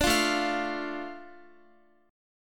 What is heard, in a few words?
A Suspended 4th Sharp 5th, Guitar; Asus4#5; strummed; x,x,12,10,9,12